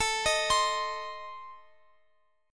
A Diminished, down Guitar Open F; arpeggiated

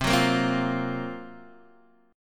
Gb Major 7th Flat 5th

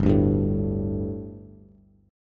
G Suspended 2nd G, down, Bass; Gsus2 strummed; JGuitar

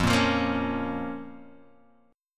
F Suspended 4th Sharp 5th F down; strummed; JGuitar

